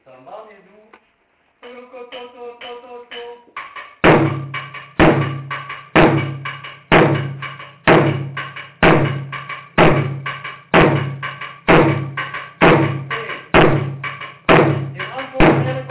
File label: Tiriba Sangban